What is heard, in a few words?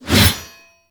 Heavy Scythe Whoosh; Melee; Duviri